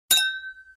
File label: beer